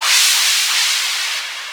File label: percussion loops, Techno; PS-45 WAV Techno ID